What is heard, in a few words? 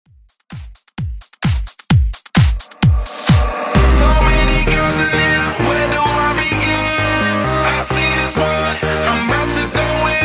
Throwback Redrum 130 Bpm